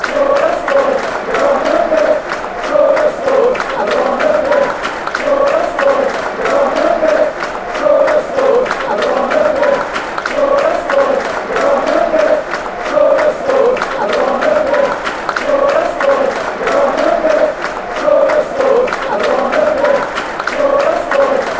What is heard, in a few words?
on the pitch, hungary chants